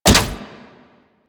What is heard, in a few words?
Ball Catch; Warframe; Missions; Tenno Ball